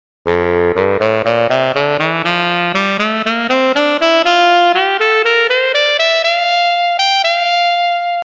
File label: Sax On